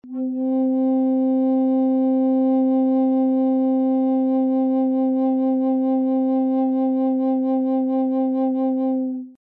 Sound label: Flutes Single Note, Bass C